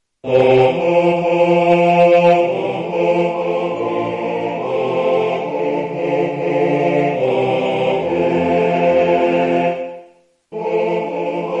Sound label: akatonbo chorus